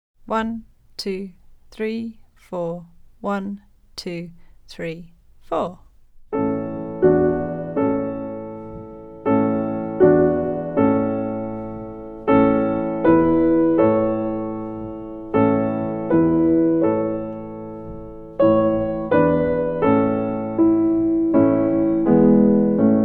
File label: Frere Jaques major backing